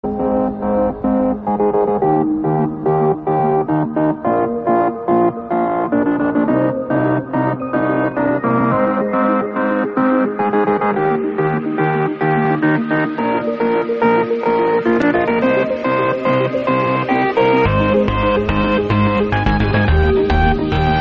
gaming bgm theme

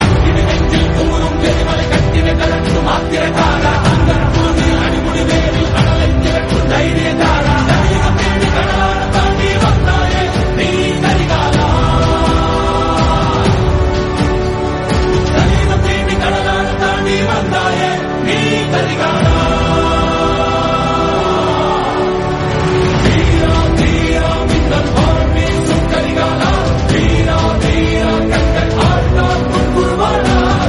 tamil song; KGF

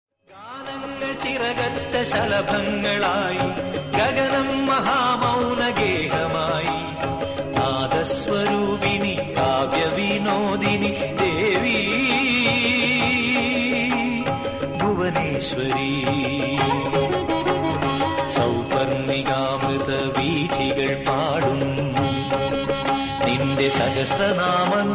songs malayalam

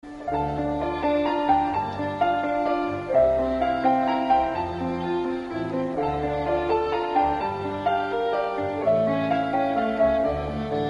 boarding music instrumental song; oman air

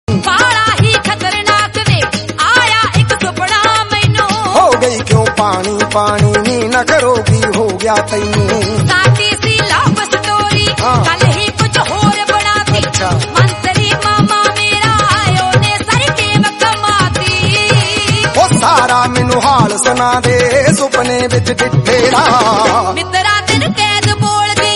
punjabi song

